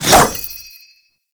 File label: Sword Swipe; Warframe; Duviri; Dax; Weapons